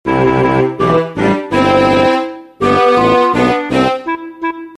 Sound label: sms